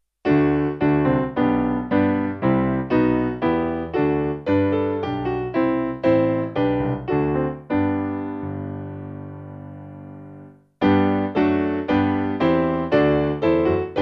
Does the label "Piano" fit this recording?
Yes